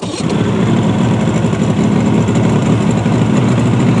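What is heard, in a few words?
start, boats; 1000fx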